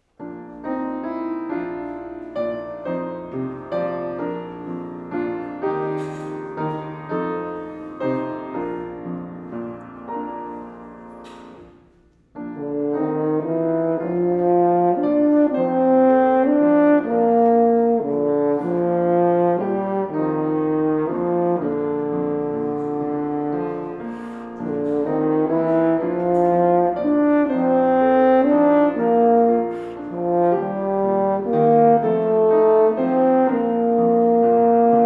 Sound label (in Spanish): corno y piano